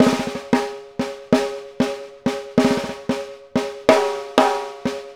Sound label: Snare Pattern, Drums; Essential Percussion